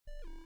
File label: failed